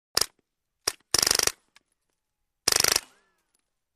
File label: 9mm Mac 10 Automatics, silenced